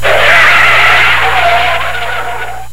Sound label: screech